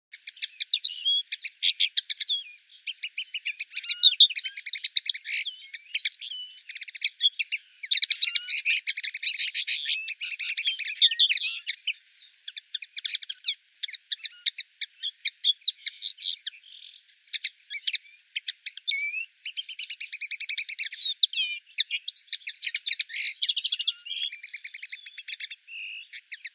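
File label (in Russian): konoplyanka golos, linnet voice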